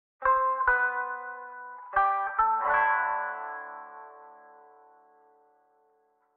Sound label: Mountain Music, Rhythm Village Intro Stingers